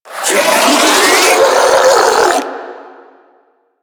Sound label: Spin Scream; Warframe; Proto Infested Ancient; Abilities